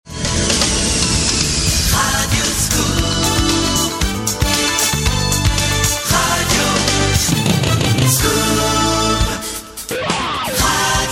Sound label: VINTAGE 80s; Jingles